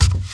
move; MEDJAY

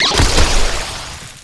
summon elemental diluvio

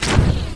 spirit flame s stand

